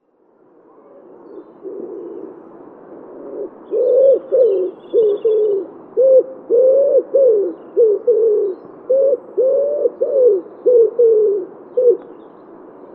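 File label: wood pigeon voice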